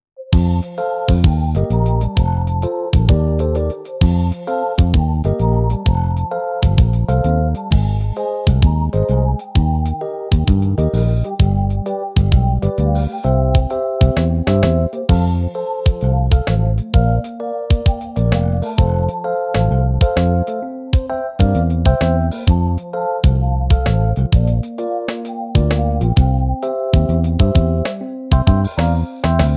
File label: Karaoke